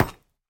下界合金踩踏声

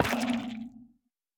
幽匿尖啸体放置声

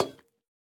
铜灯踩踏声

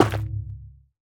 菌光体破坏声

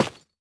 凝灰岩砖放置声

